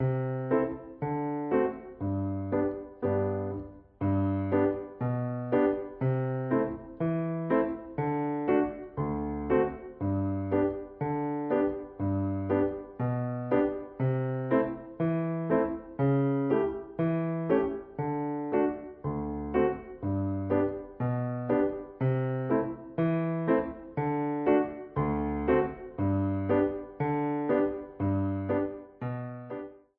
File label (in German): die alte dampflok playalong